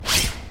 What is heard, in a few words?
Swift Sound